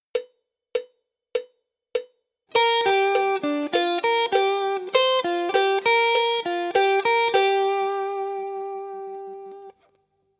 G minor pent lick, guitar

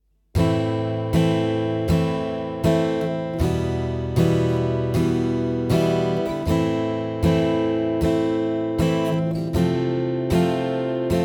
Chord Progression Example, guitar

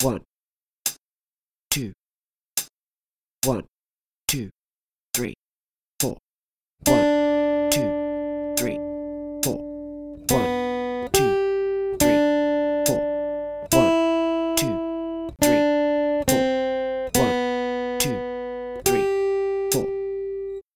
2 Strings Longs, guitar

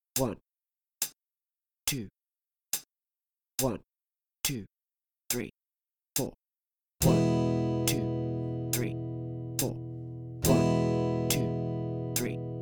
2 chord ex 3, guitar

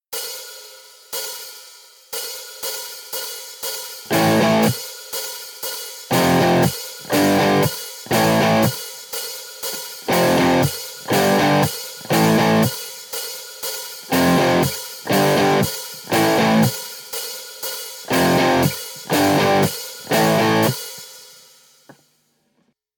Riff Three Notes, guitar

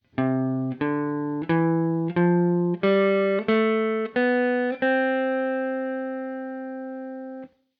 C Maj Scale, guitar

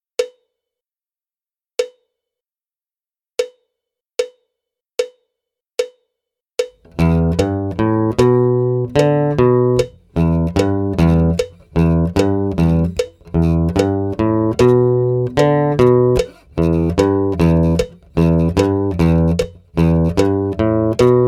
Riff Acoustic, guitar

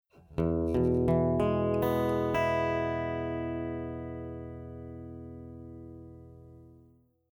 E Arpeggiated Example

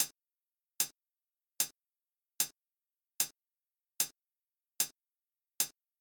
pulse 75bpm

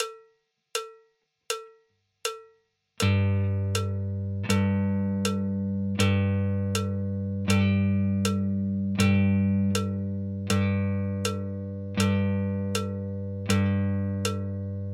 rhythm Half notes, guitar

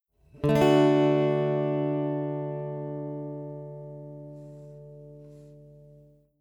D Chord Example, guitar

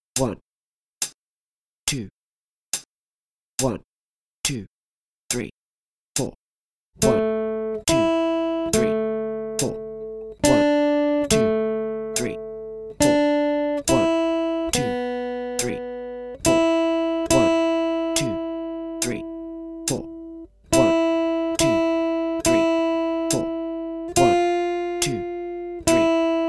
3 strings, guitar

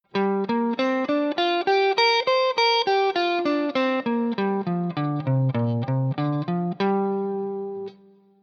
G minor pent Shape, guitar

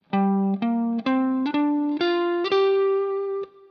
G Minor Pent Comparison, guitar

